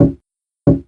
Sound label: desk tap; narcissus